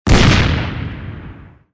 cannon fire alt; Toontown Online

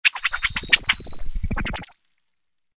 finger wag

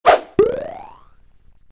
guilt trip

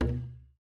ball kick high; toontown-ceiling

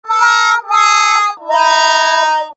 target lose; Toontown Online

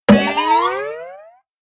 mole surprise

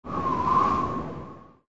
wind

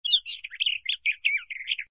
bird

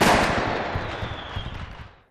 firework explosion

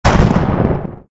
lightning

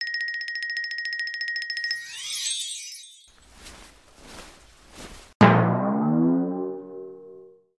downsize; toontown-ceiling

